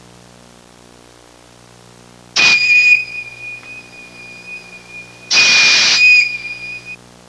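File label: train whistle, treni; trasporti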